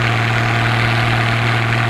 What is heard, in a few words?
jeep fast